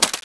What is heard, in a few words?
draw; vulcanus1; cstrike